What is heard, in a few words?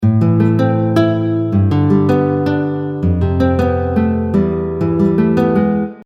guitar; garageband logic